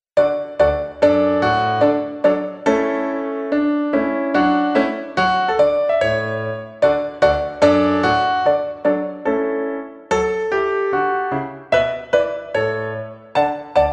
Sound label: Trumpet Tune; Jeremiah Clarke